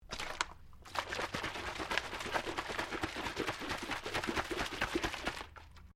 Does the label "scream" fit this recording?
No